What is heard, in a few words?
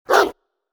dog barking